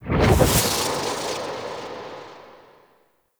Evil Twin Vanish; Liset; Warframe; Chimera Prologue

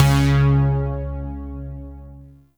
Shawzin Oct One C Sharp; Warframe; Zariman Shawzin

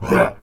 Infested Melee Grunt; Warframe; Characters

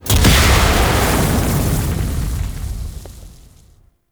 Corruption Detonate; Enemies; Abilities; Warframe; Zariman; Void Angel

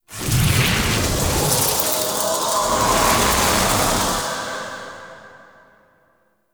death rise; Spawn; Warframe; Kuva Lich; Abilities

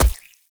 Raw Meat Impact; Gameplay; Awakening Remaster

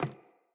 Sandals Run Wood, Footsteps

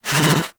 Pet Alert Snort; Enemies; Warframe; Catbrow; Pet Ship

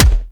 Gunbrella Punch; Weapons; Warframe; Tenno; Koumei

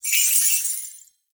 Naginata Whoosh Tonal, Weapons Melee; Warframe; Koumei Naginata

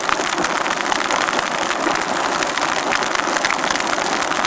Large Fire; Warframe

